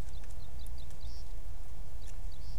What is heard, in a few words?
notes of Plain; Sutirtha Lahiri and AK